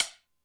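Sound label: Chopsticks, Drum Machines Alesis D4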